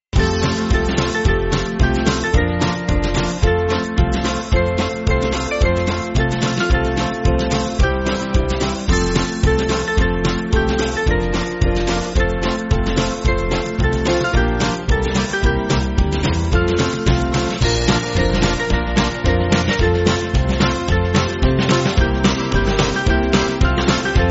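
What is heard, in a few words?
Band, Music Backing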